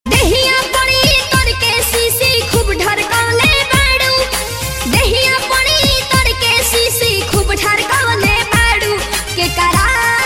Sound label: BHOJPURI RINGTONE